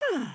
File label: curious, emotes